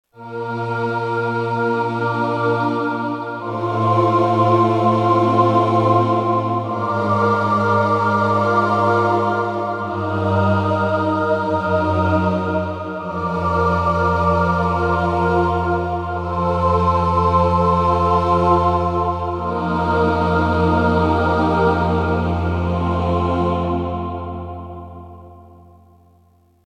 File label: choir